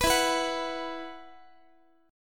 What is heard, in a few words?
E Suspended 2nd, Guitar; Esus2 strummed